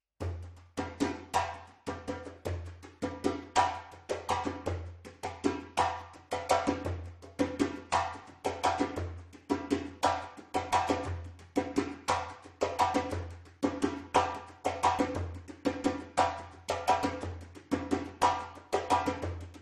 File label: timbal